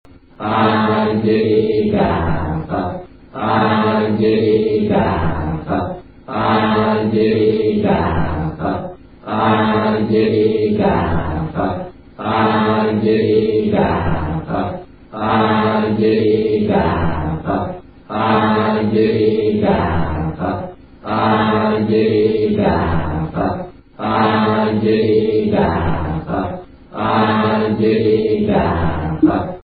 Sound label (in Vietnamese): Niem Phat Nguyen Chat Uc Chau